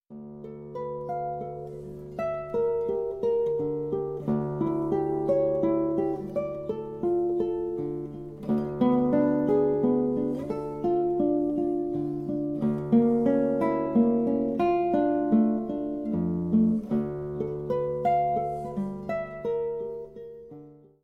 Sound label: minor cascades, guitar